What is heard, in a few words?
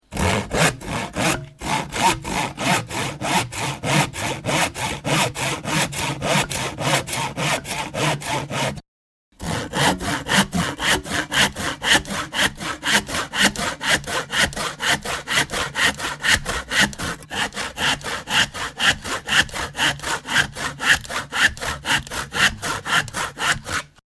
Sawing, Sawing wood with a hand saw.; Hand Tools